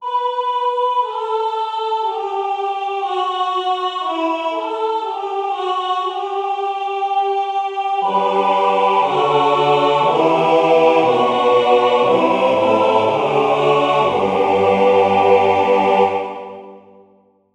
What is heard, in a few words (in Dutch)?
4 stemmig amen sopraan